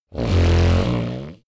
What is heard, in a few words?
monster snore short low